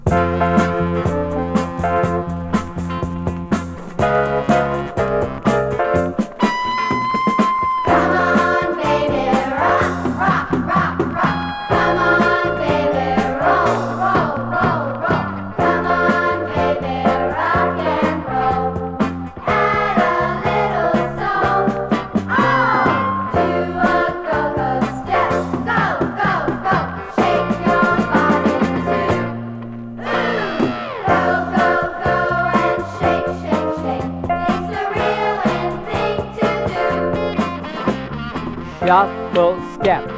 Song and Tap; Grade 1 2

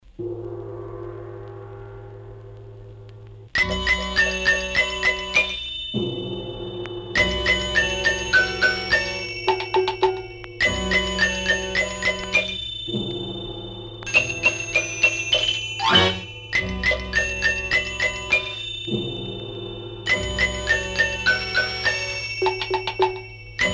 Chinese Lullaby

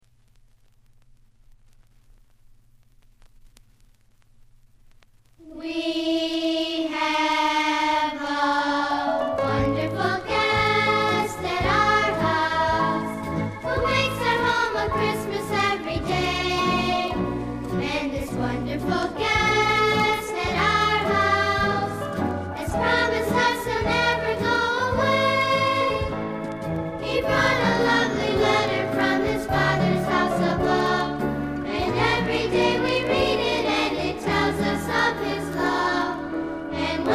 Choir

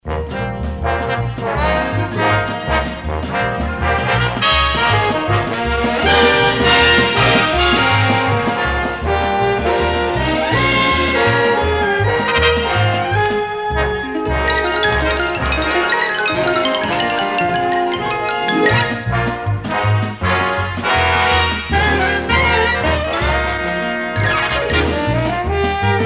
Music Bed

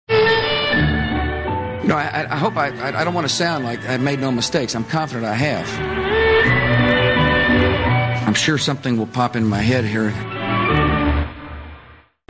Musical Pop In Head

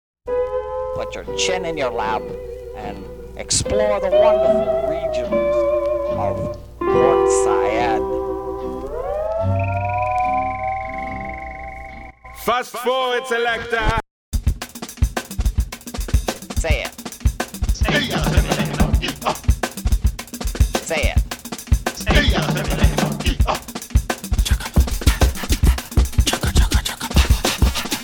Bass and Drum Mix

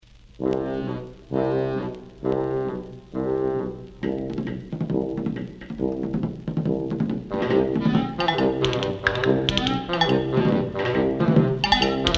Railroad Train Samba

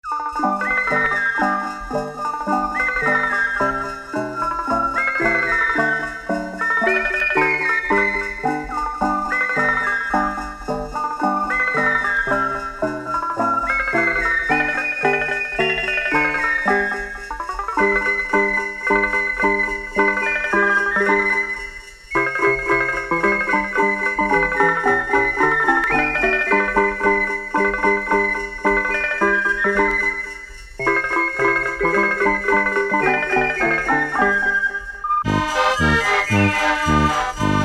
Jingle Bells; Special Ed Xmas

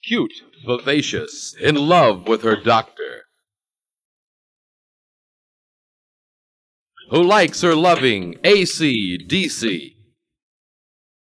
Vocal

